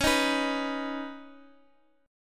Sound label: Db 7th Suspended 2nd, Guitar, Open D